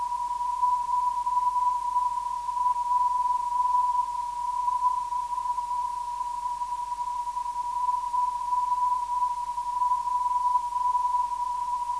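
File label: non-directional beacon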